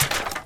Crate

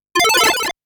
Coin Insertion; snk arcade; SNK Arcade Sound Digital Collection Vol. 24 2021

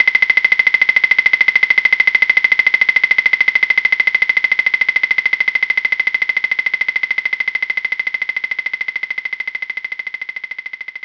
claves

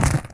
ice crunch

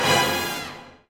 Combo Stab

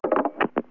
Phoneline Hangup

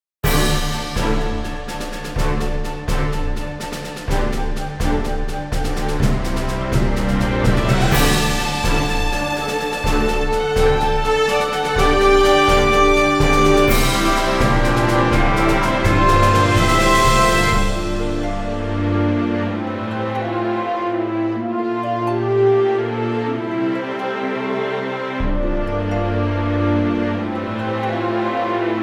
Sailing; oceanhorn monster of uncharted seas

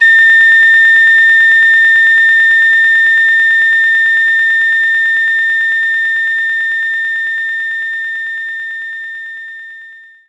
Whistle A5

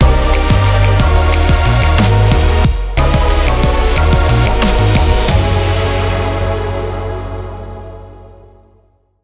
Goal Jingle

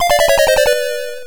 Death Jingle; Dig Dog; Android

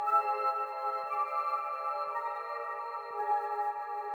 Music Bonusunlock Zodiac; sayonara wild hearts 2019 gamerip

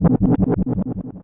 Paralysis

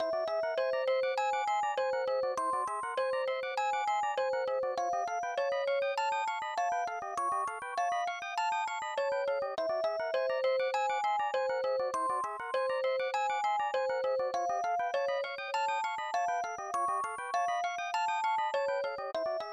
Completed Puzzle; mario s super picross 1995 snes

